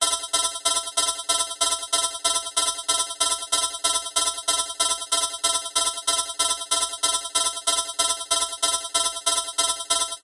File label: science fiction alarm fast warning tone emergency